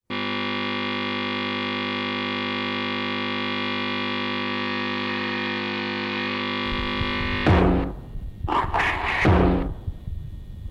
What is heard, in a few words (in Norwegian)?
Gitar